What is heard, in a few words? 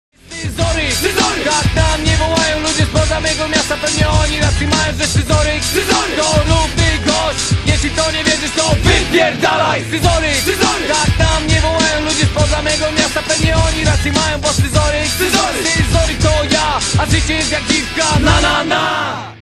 applause